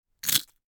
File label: Chewing Breadstick Single